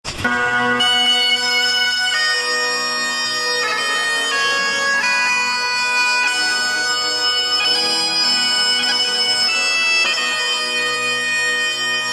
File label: Bagpiper Organ; Baltimore Bagpiper